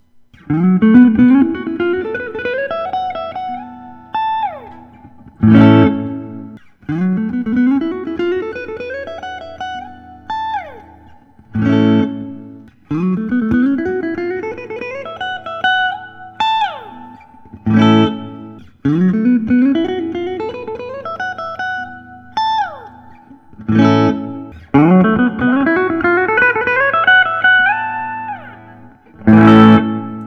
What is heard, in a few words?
suhr standard carve top natural